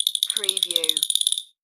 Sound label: Skeleton Teeth Chatter; sound effects bible